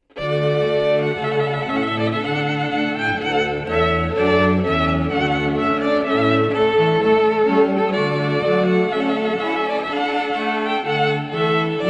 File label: Trumpet Voluntary